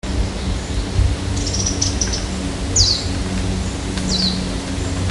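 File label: mejiro, Japanese white-eye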